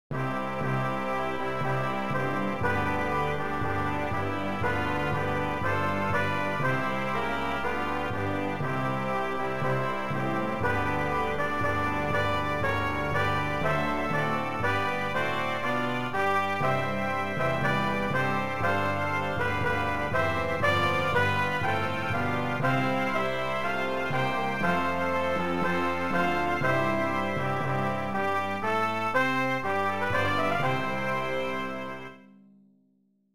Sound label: Brass; Hymn 719